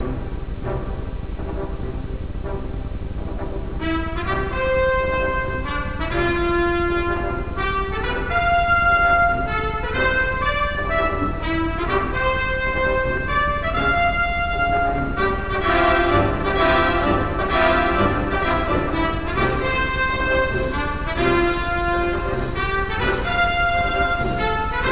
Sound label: theme